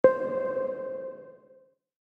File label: Plucky